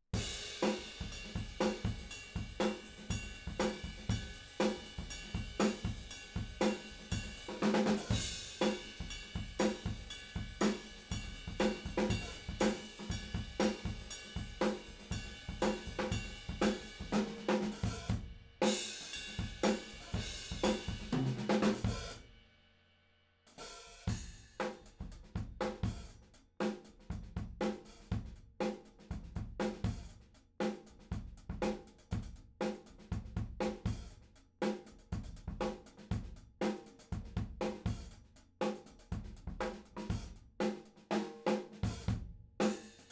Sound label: Room; Reaper project